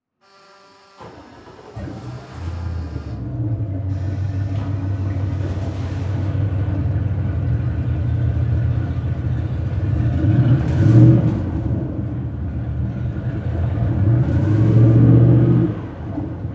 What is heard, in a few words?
Transportation Patrol Boat Start Rev Off At Dock